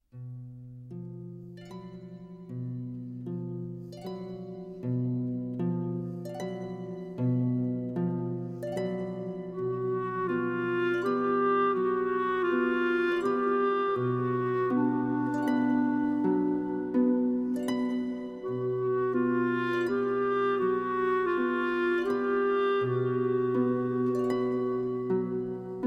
Second flute; Three Colors - Blue

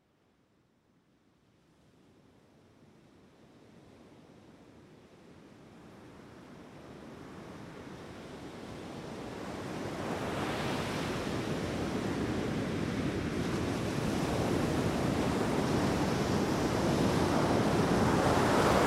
Pacific Surf & Songbirds; Morning Songbirds

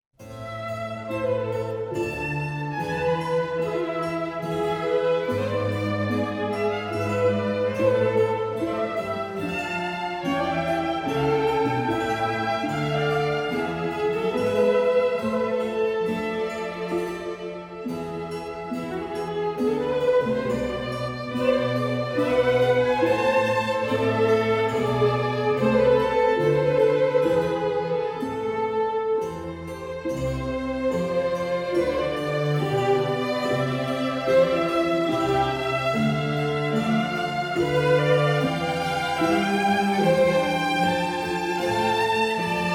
Concerto; Albinoni; Larghetto from Concerto No. 9 in C major

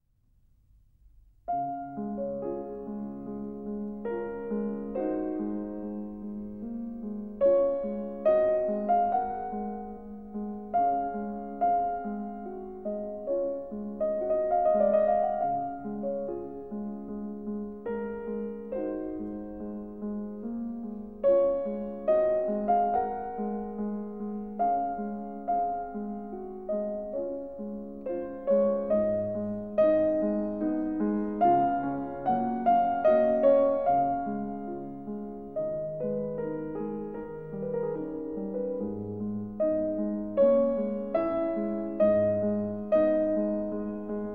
Prelude Nr.15 in D flat, The Piano; Raindrop; Face-Off; 100 Best Film Classics

